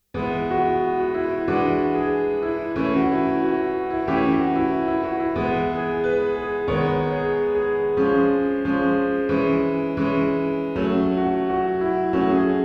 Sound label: piano pop